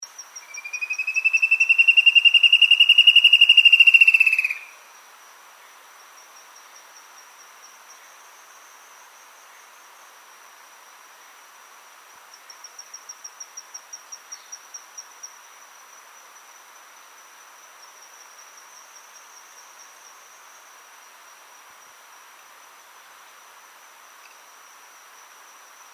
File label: spot-backed antshrike